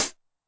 CH MOD Tune, CLOSED HAT, Roland TR-909 Modded; 300 Drum Machines; Modded Roland TR-909 Sample Pack

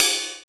HH, Dance Mix

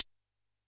Analog HH Closed, Yamaha PSR-E323

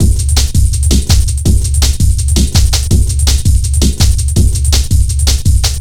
distant rumble, Drum Bass Drumloops 165bpm; Zero-G; Total Drum Bass

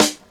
SD, Dance Drums

